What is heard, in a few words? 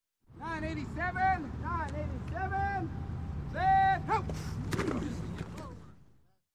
Football Practice; Sound Ideas 2000 Series